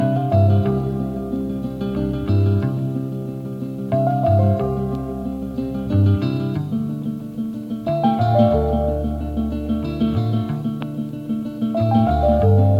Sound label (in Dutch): Jingle; Station Calls